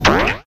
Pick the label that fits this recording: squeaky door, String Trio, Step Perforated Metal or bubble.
bubble